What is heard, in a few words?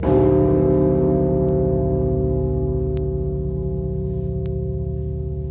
bell sounds; rostov